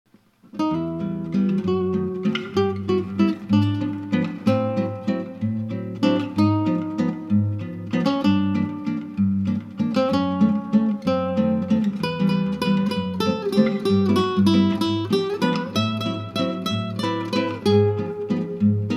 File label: valse, guitare